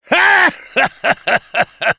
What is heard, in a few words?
laugh evil, fr; Engineer; Rire machiavélique 2